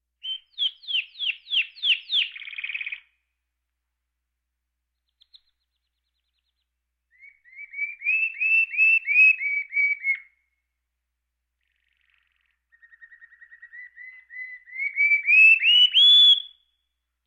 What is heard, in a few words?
Birds